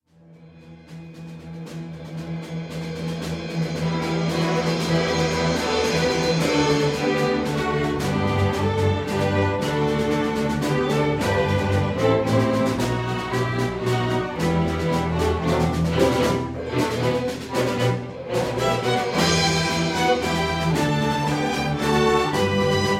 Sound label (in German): Grosses Orchester